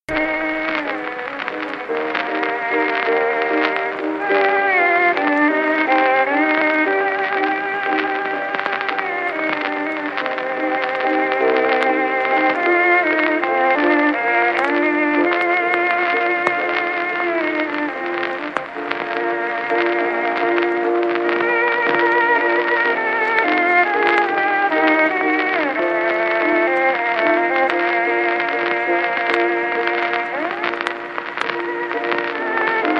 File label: Spanish Dance